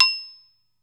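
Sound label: SARAN C4, Metalophones, Pitched Percussion; E-MU Producer Series Vol. 7 – Old World Instruments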